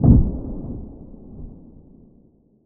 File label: artillery single, battle ambience; indirect; SPT Battle Ambience